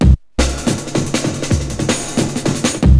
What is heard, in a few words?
break